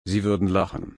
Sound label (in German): lachen